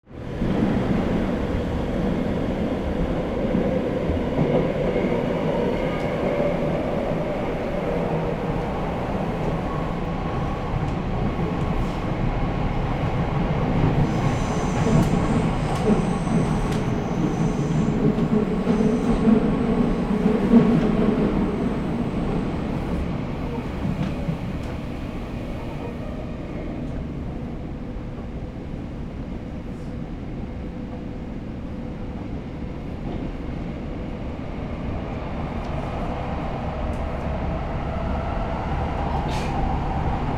metro interior, ambient

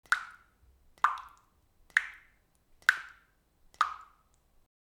water dripping; nature

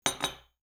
coffee cup; drinks

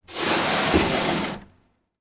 rowing boat hit sandy shore; transportation

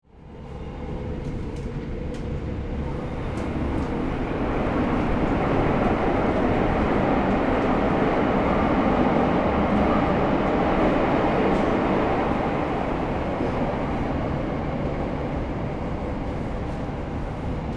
metro interior, ambient

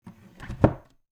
cabinet door close

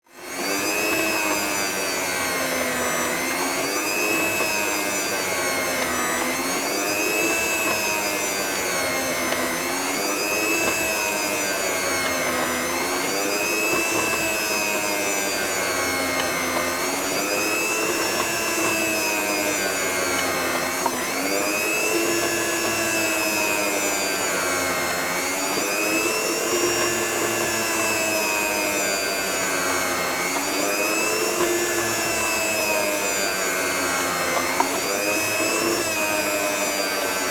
meat slicer; appliances

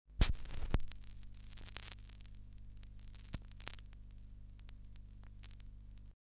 needle drop

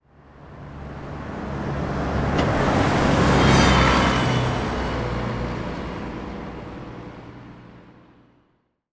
bus pass by; transportation

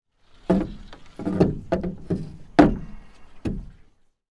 rowing boat oars in; transportation

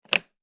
briefcase lock

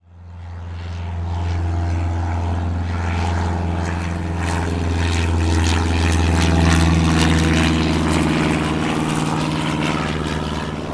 propeller plane fly by; transportation

